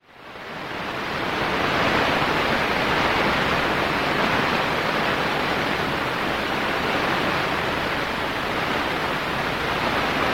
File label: L-burst Noise